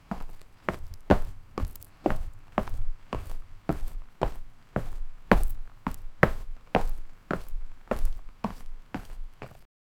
footstep on the ground

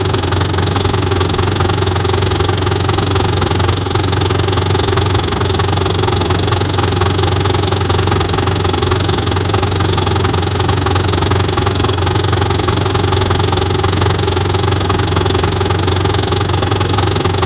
high rpm v16 engine sound

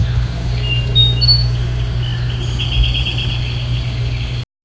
soft morning bird calls

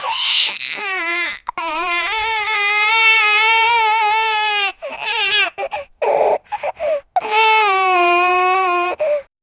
ultra realistic human ba, crying

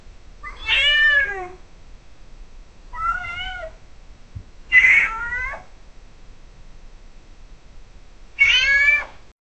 a cat sound and a, bathroom

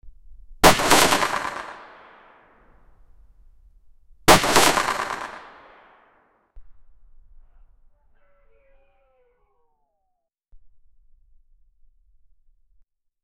the sound of a shot, artillery

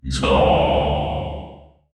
dark ritualistic chant, cultist

